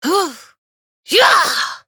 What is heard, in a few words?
swoo; dqxi; serena